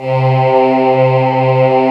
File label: choir; 90s Sample CDs; Club-50 - Foundations Roland; Scats Choir